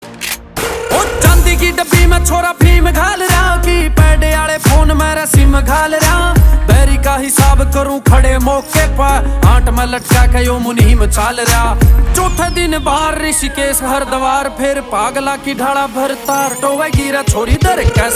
Haryanvi

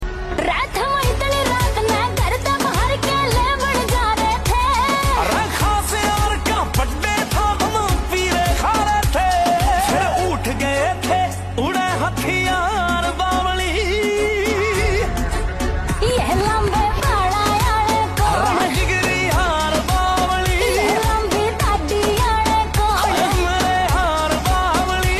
Song, ringtone

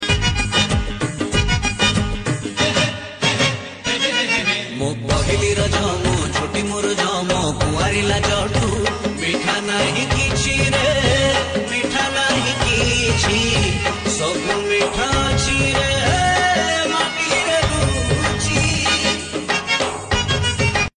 Odia Song

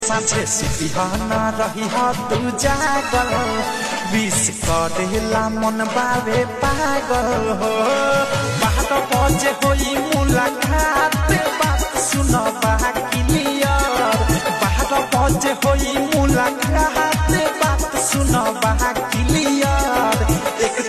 Happy New Year, ringtone

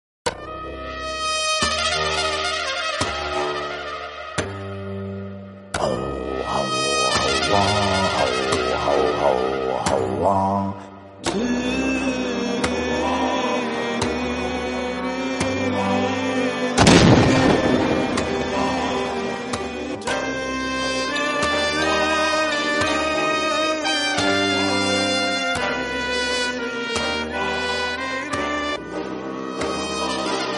Theme Music; Vada Chennai

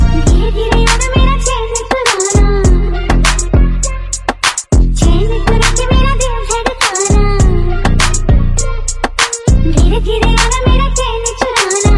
Remix, ringtone

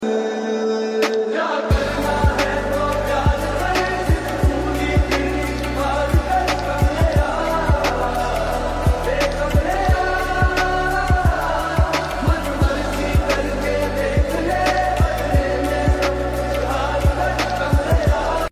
Lofi Mix

